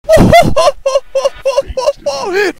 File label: laugh; Trick2g Soundboard; datlaugh